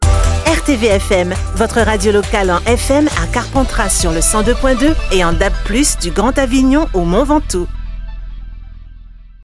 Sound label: Jingles; Carpentras